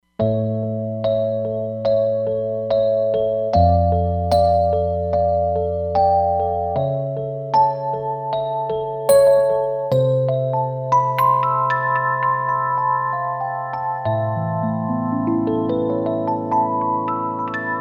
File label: Crystal EP, Roland Fantom XR